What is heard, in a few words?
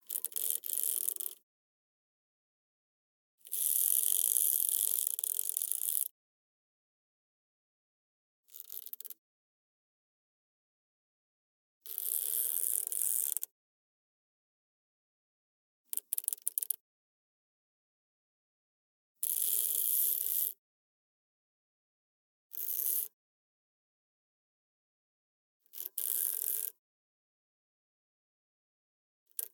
SQUEAKS AND CREAKS Mic Stand Short; Squeaks and Creaks v2